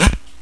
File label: bricks; Silicon Volleyball